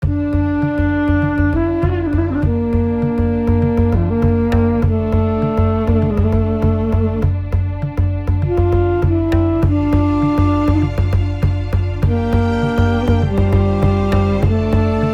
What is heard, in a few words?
Passionate ney flute and duduk